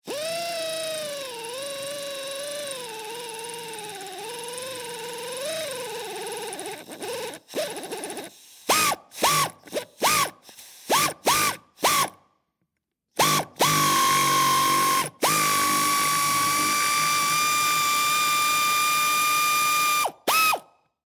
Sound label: Air Ratchet Screw, Industry, Air, Ratchet, Screw, Bolt, Into, 4X4, Long; SoundEffects-BlastWave